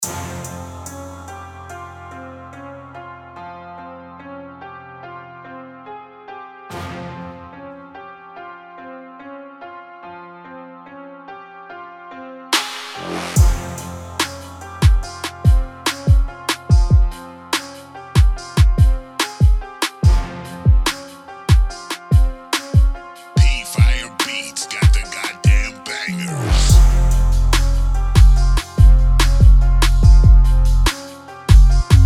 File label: 144 bpm beats